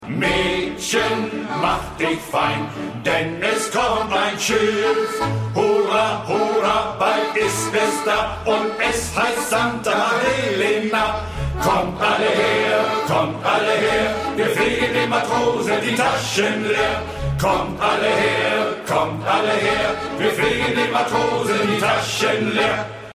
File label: song